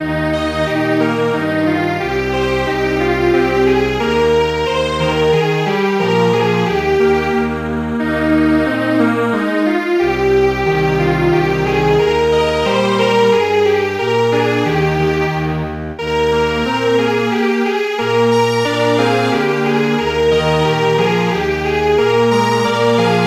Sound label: Strings Quartet; Heaven and Earth Rejoice Today